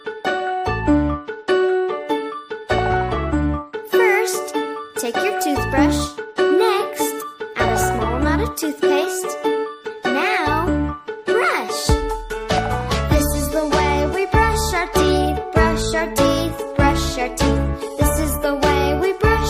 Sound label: childish toothbrush song